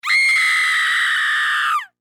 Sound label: Human Female Scream Long; Screaming (Sound Effects:Voice)